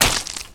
Blood Splat Gib; SFX Kit; Foley - Misc

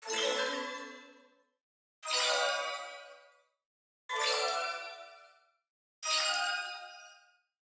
Arcade Click Neutral; boom library; The Interface

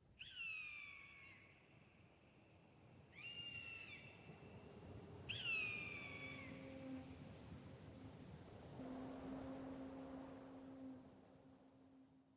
Cowboy Ambi; Pitch Black Audio Pong

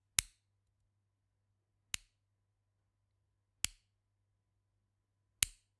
Generic Click Wood Tiny

